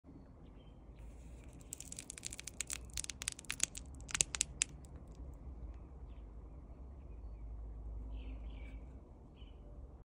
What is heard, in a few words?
sticky; چسبناک